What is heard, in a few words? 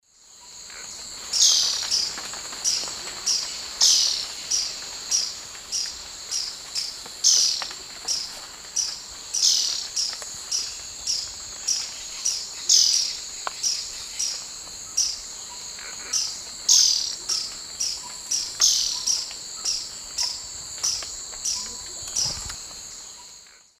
Myrmotherula hauxwelli, alarm call, S. America Eastern Lowlands